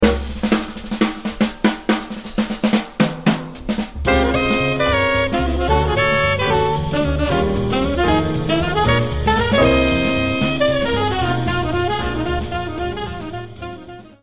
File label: shout